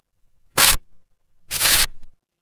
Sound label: paper fabric tear